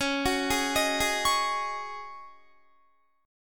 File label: Db Suspended 2nd Suspended 4th Db Guitar; arpeggiated; 21,21,18,20,20,20